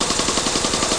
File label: Wheel Motion; Funhouse of Love